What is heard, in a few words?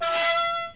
open